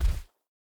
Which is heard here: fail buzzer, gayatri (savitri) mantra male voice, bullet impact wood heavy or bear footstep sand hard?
bear footstep sand hard